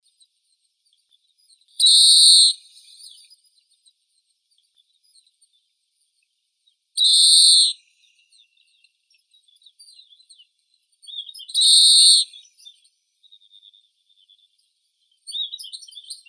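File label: grey-capped greenfinch, Chloris sinica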